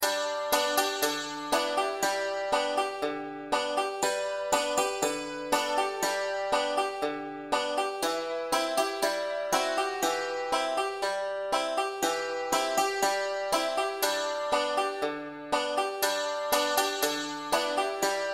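this lil light simple accompaniment with melody, banjo; beginning 5 string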